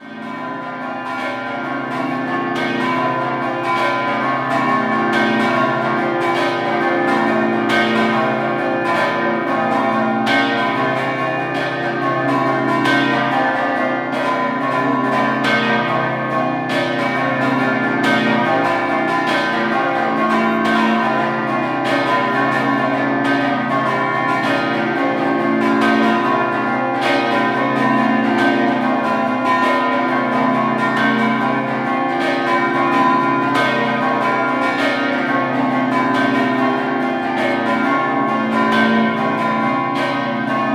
GEMONA, campane

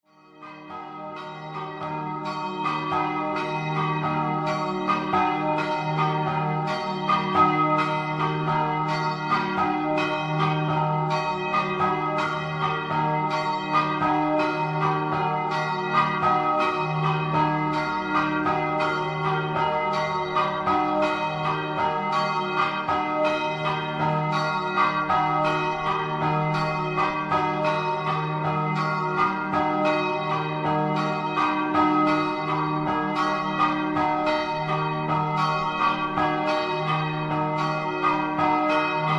VALBRUNA, campane

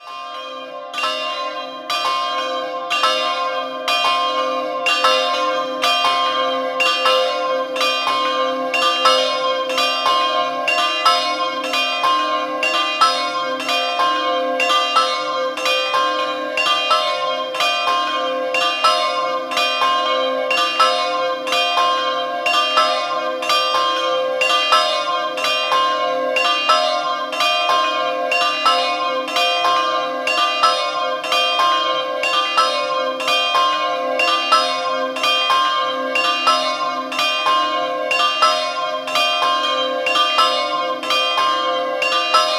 Cordenons, campane; Sant Antonio

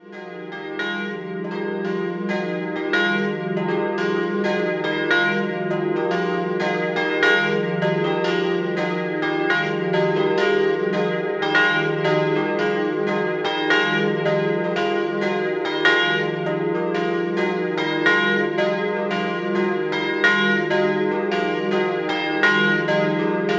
Doppio, campane; Tomba

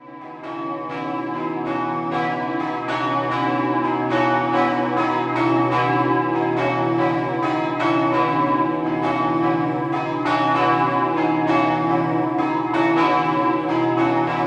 Sesto al Reghena, campane

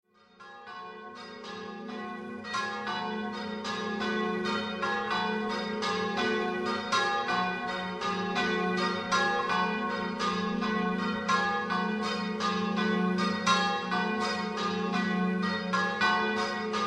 bugnins di Camino, campane

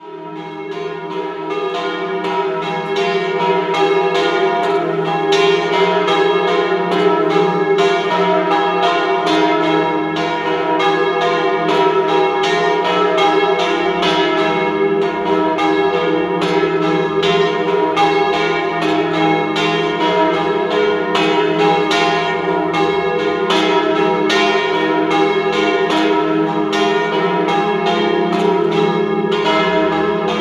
Fontanafredda, campane

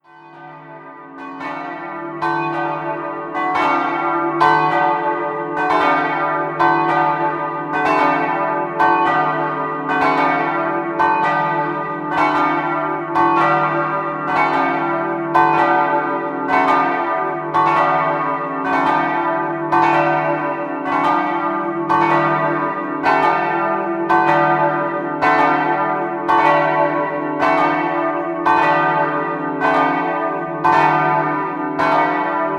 Opicina, campane

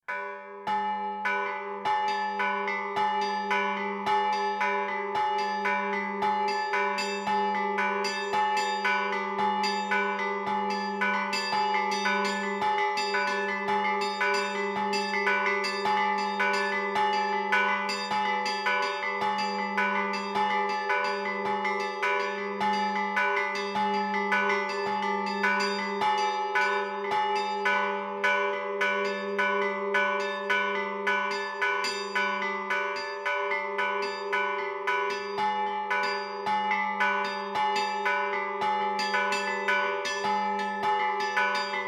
Scampanottata; campane; Plaino Tavella

